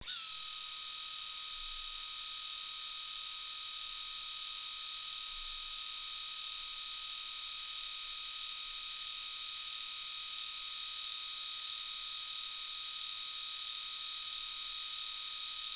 philips 9000series sound

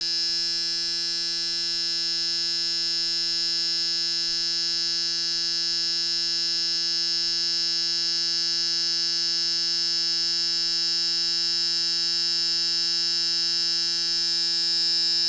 braun 9090cc sound

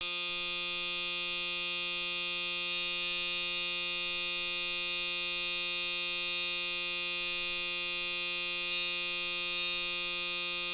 braun series7 sound low

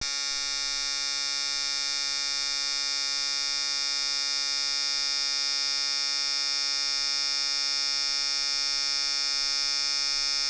hitachi sblade sound

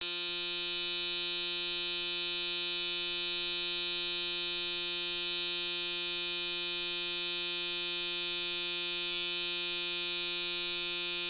braun series7 sound middle

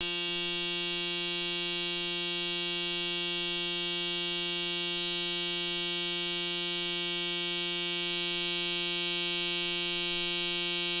braun series7 sound high

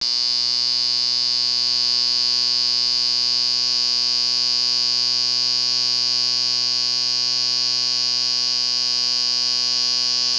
hitachi s blade

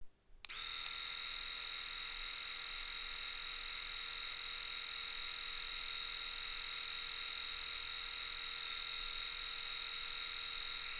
philips pt sound

